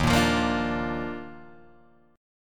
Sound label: D sharp Major 7th Suspended 2nd, Guitar, Open G